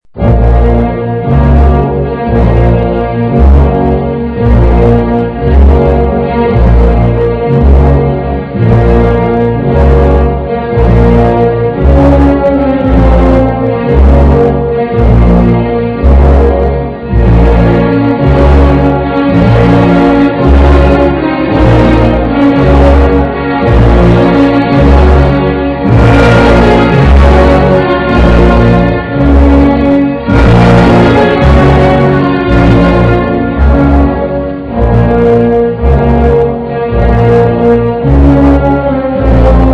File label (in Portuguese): Marcha Funebre; Vinhetas